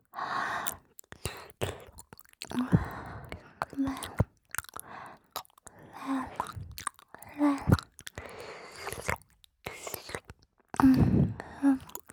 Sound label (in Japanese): ぺろっ…ぇろん…, ASMR 左耳